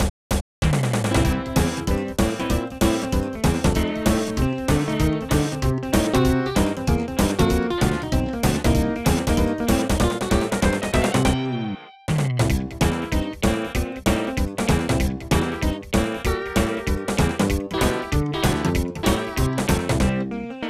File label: Rock & Roll; Tomodachi Life